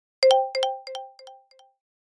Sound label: Alert notification delay